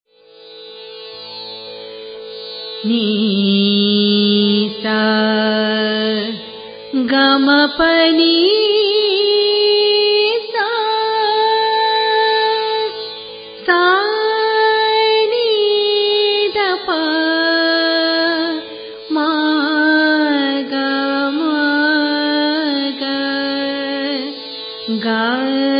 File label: bihag, raga